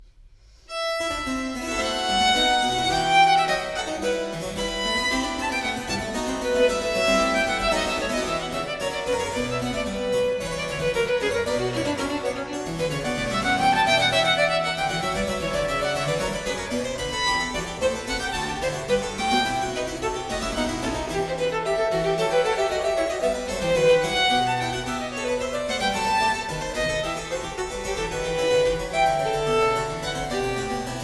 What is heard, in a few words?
Sonata No. 5 in F Minor, Allegro, Violin and Harpsichord; BWV 1018; Bach; J.S. Bach